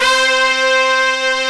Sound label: RICH BRASS; AKAI S6000 CD-ROM - Volume 1; BrassWind